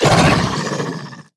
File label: Monster Attack Finisher; Monster Lizzie